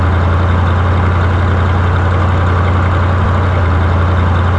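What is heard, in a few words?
TRUCK LOOP; REDNECK; RAMPAGER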